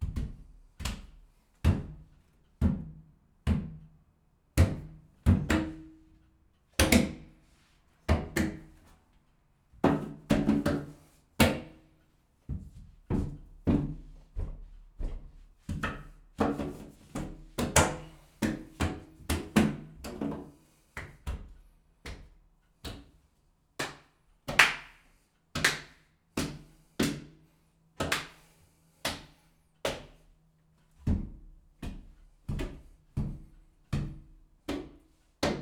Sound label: Hand Hits Bumps Empty Bath; Fairlight Sound Lib